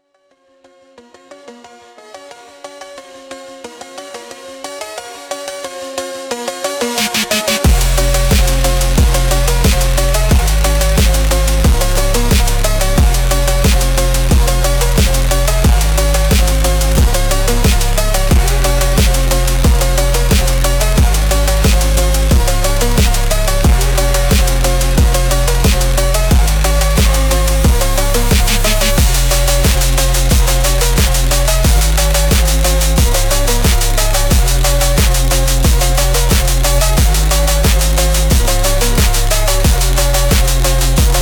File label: night phonk